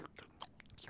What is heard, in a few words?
Eat Vox; Civ4 warlords